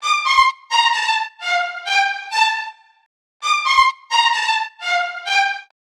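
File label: strings resonance, Loops; Phonky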